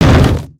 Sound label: Spawn Land; Dragon In A Box; destructibles